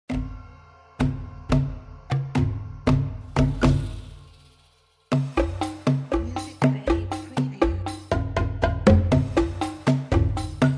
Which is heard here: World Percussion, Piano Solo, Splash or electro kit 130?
World Percussion